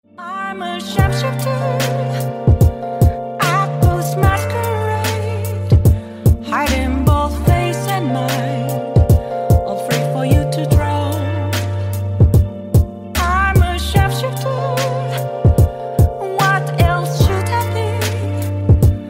Hip Hop, ringtones

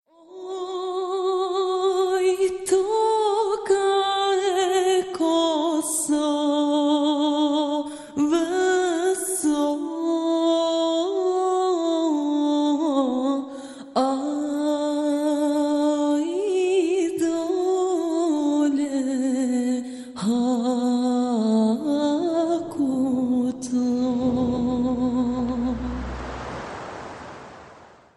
Ska

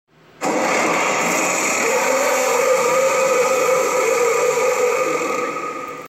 Entrance; Scrake

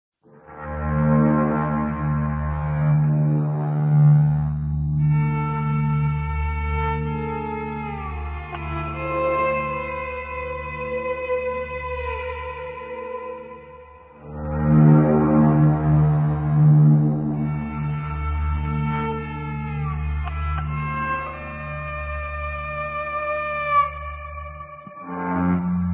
fretless guitar with a bow

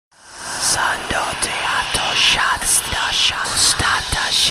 demon voices; ringtones

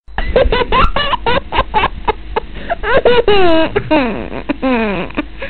baby laugh nice; children

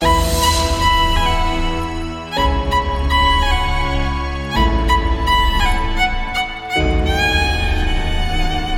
Romantic

